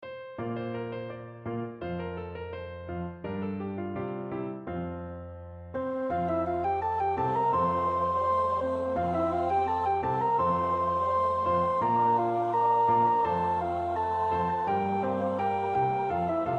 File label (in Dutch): Madrigal of Joy (alt), Oefenbestanden